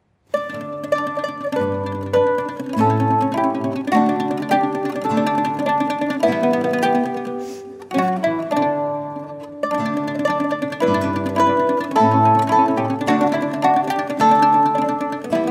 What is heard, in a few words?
english country garden